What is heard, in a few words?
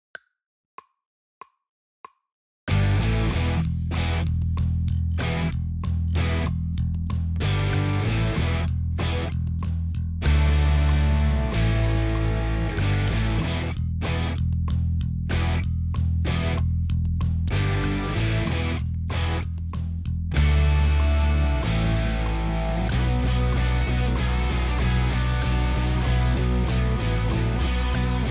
drumless